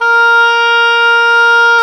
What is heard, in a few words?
oboe, mf f; Roland L-CDX-03 Disk 1; Oboe 2&3